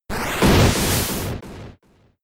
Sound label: Sky Uppercut; Pokemon; Gen 5; Blk Wht Blk2 Wht2